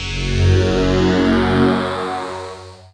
PAD Dance; Best Service Gigapack 1

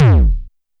BD Synth; YAMAHA RM1X

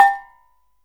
CANANG G4; Spectrasonics; Heart of Asia

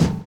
OLD SNARE; Univers Sons

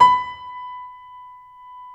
STEIN B4, STEINWAY GRD; Greytsounds VOL-1

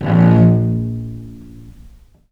VIOLE MUT A#0; Historical Instruments; USB Soundscan vol.23; AKAI

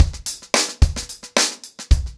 Hip Hop 110BPM, acoustic drums drumloops

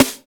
PUNCH SN; East West - Phat & Phunky CD2; 90's Sample CDs

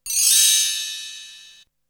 Chimes, Drums; ZERO G - Datafile DISC 2

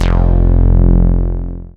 bass SY Bass Syn; Best Service Gigapack 2

